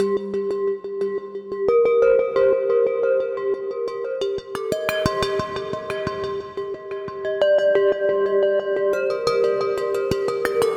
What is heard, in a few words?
Trepiditious Mallets - Gmin 89 bpm; MIDI Clips; Tonal; Motifs; Ableton; Mood Reel